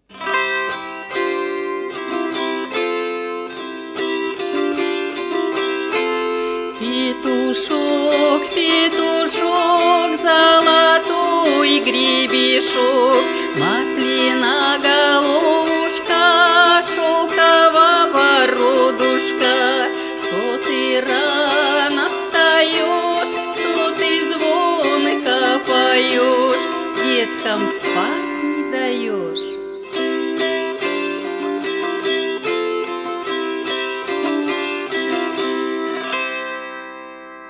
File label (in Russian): Петушок попевка